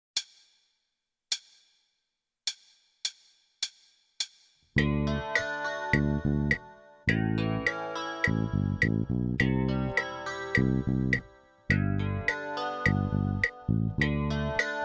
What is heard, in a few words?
Pattern Rock In 1/8